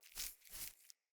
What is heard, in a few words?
Grunt Foley Active Idle; Enemy; Characters; Corrupted Sproutfolk